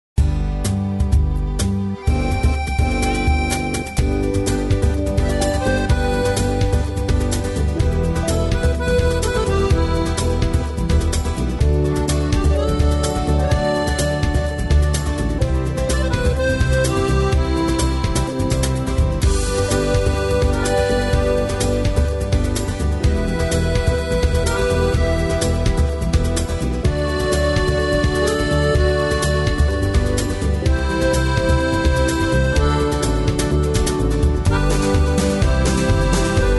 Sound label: rhythm track, square dance music